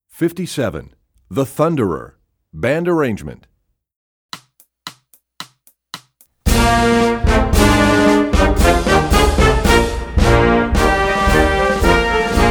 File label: The Thunderer, Percussion; EE2000 Book2